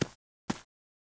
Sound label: footstep walk loop; open toontown resources